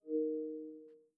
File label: map close; radar